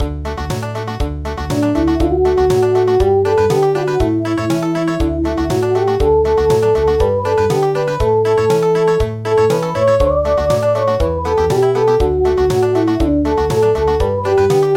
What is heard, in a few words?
Lullaby Country